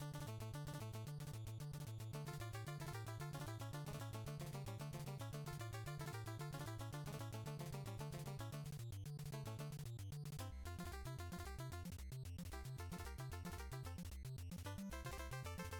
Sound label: mus main fast; tetris